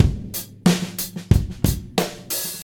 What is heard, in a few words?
122 bpm 00s drum loop f sharp key, Free breakbeat sample - kick tuned to the F# note